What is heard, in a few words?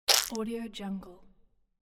squash